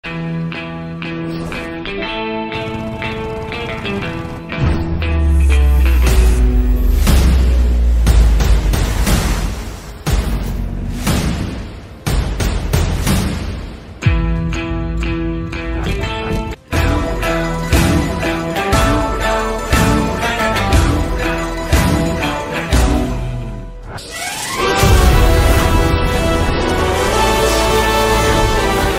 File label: Kannada ringtone; badava rascal